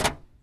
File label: Plastic Clatter; BB Retail Therapy Sample Pack